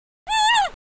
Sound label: panda moaning; petz